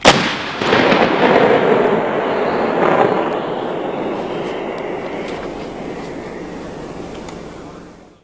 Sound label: fire